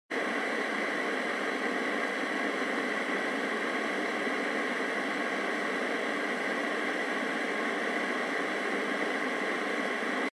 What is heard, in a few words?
Radio Signal Noise AM